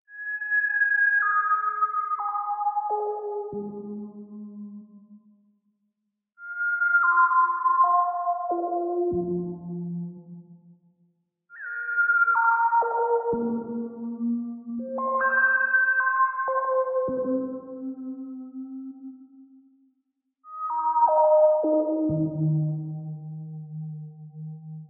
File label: Autumn Hi Synth; Proteus